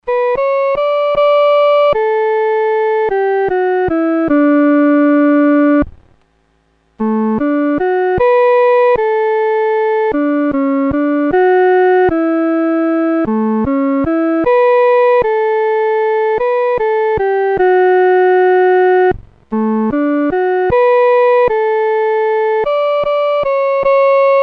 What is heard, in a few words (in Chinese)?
独奏 第一声